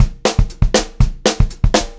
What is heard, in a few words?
BEAT, Pop-Rock Drumloops; USB Soundscan vol.37; AKAI; 120 SPEED